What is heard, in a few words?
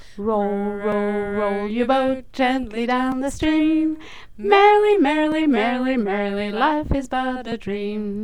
mixed 15ms delay